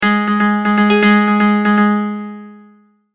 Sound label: Multimedia Tone-Thinking/Processing